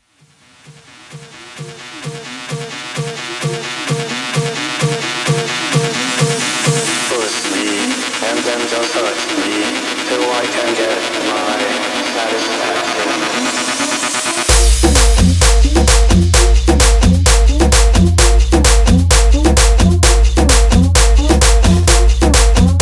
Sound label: tribal remix 130 bpm